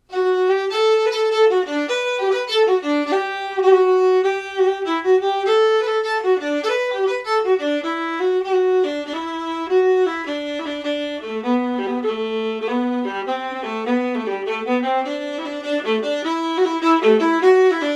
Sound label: West to the Tiers, Melody, Viola, Jig